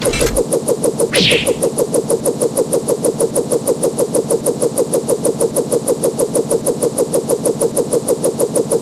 pointing

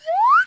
enter

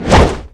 swing

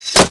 gavel close

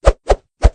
combo; rocco

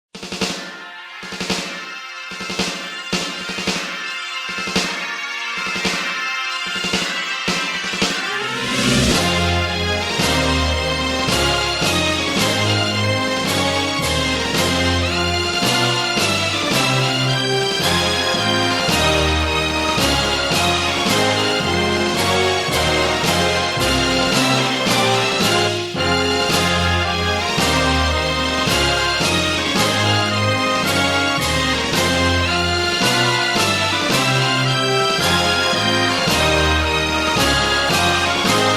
folk

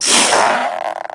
snake content

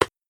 catch

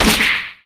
punch

